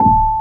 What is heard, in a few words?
BOX ECHO A3, MUSIC BOX; AKAI S6000 CD-ROM - Volume 1